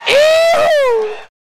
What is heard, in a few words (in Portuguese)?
eee hoo grito sertanejo caipira; UOL; Bolsonaro